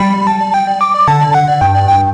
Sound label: Jingle Level Complete